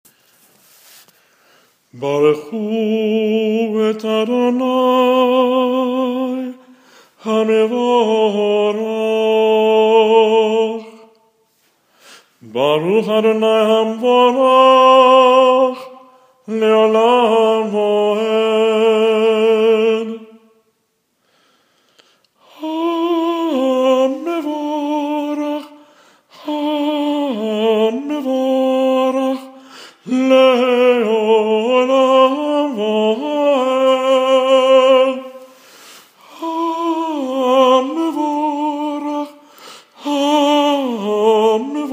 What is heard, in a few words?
barchu